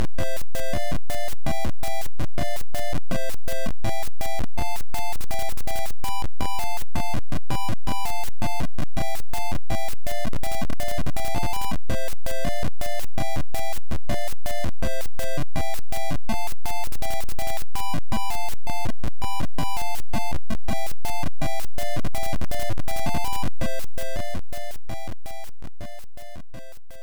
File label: Main Music; lot lot; nes